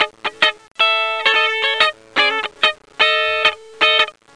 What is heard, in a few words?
Guitar Loop; Loop Samples